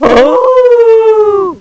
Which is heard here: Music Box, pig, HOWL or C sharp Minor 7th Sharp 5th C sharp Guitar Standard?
HOWL